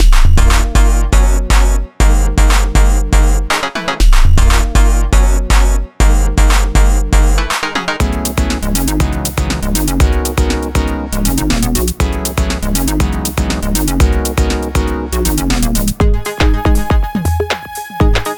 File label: Bass House